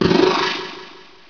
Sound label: charge; tron